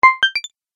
Tap Play Button; Button Sound Effects; multimedia

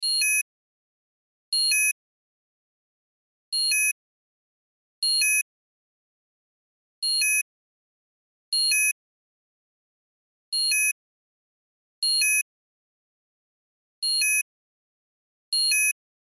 Cell Alarm Low, Cell Alarm Low Battery Chime Loop; Alarms; Electronics; Blastwave

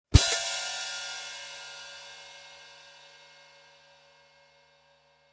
Cartoon Drum High Hat Close And Open; Comedy/Cartoon - Drum, High Hat Close and Open 01: Hi-hat lingering; Funny Sounds

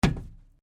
Cardboard box hit

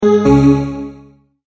multimedia tone error

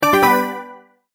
multimedia tone, Goodbye

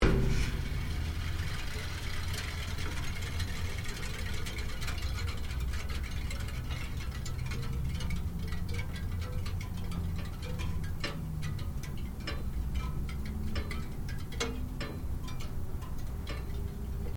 TOASTER POPUP ELEMENTS COOLING; Various kitchen appliances; Kitchen (Sound Effects:Household)

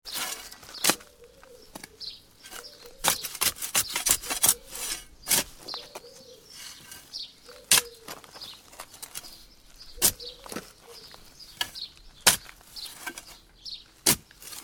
Digging soil with a spade in summer; Hand Tools

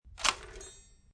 Load, Gun Handling; Guns; VRSonic

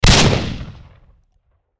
Grenade Explosion Debris Blast; Warfare; Grenades (Sound Effects:Explosions); Blastwave

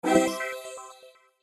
DIGITAL SWEEPER; Multimedia; Sound Design; Blastwave

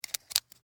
Sun Glasses Close, Foley, Sun, Glasses, Close; Dressing; Human; BlastWave

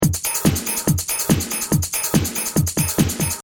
Techno Trance Drum Loop 142 Bpm Sound Of The 80S; Royalty Free Drum Loops

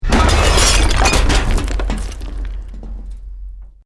EXPLOSION MEDIUM BRICK WOOD GLASS NO FIRE

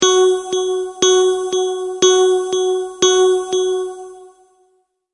Multimedia Tone-Thinking/Processing

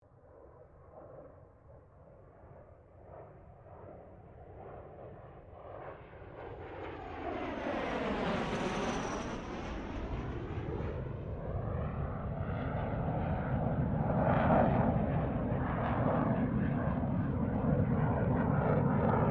AIRCRAFT CA-27 SABRE PASS STEREO

